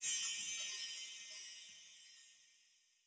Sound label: Shimmer; Boomerang Fu